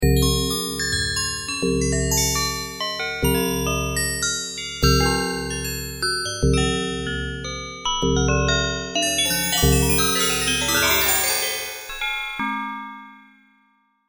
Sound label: chimes